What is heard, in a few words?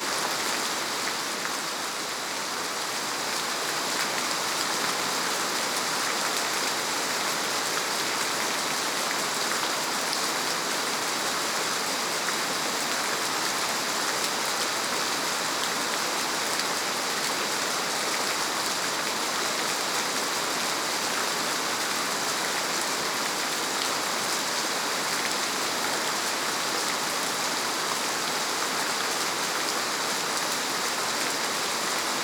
ambience rain heavy; Rust